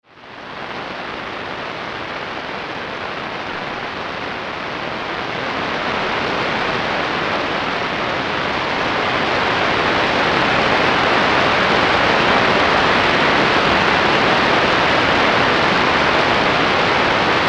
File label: 21.1MHz; Ashcraft